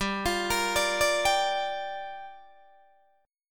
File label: G Minor 7th, Guitar, DADGAD